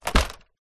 leisure toy plastic helmet mask set down carpet

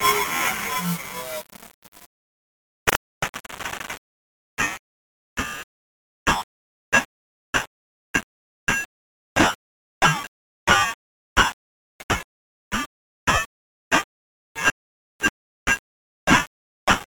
Static Radio Glitches; bottlerocket

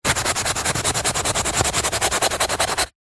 office marker pen scribble on tissue paper

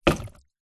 household plastic bucket water set down

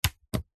technology radio switch toggle click; zapsplat

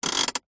transport car suv hand brake apply

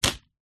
warfare slingshot fire

